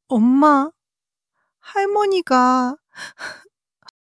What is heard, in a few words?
crying; monologue